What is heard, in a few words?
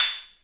Korg KPR-77